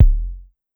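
Kick 909 Up, Drum Machines Clavia DDrum-AT Pitch Envelope; 300 Drum Machines; Pitch Env Various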